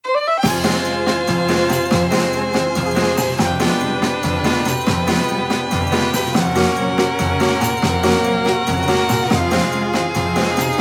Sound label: Battle loop; RetroRoman